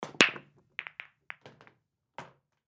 Pool Break Balls Scatter Pockets; Billiards; SPORTS

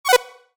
Menu SFX: Go Back; Buttons; Multimedia; Stuart Duffield

